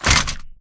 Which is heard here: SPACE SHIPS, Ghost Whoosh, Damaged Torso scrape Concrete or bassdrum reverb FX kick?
Damaged Torso scrape Concrete